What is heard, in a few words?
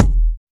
kick drum single shot e key